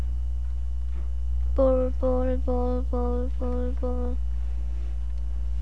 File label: boar